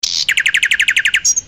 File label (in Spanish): Pajaro